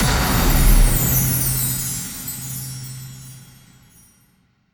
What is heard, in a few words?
Bonus Share Animation Unlock; genesis; jasons Quest Desktop